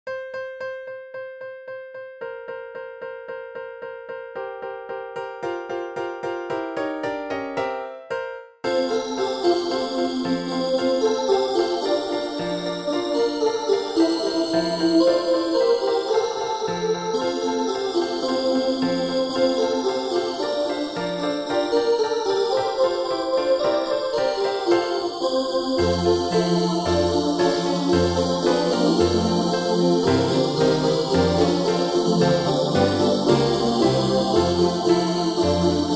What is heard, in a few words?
Jingle Bells